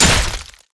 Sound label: basicattack human; LoL; shyvana